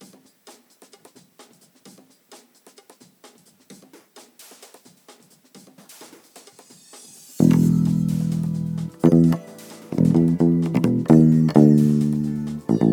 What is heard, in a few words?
Bass; norm; iXZ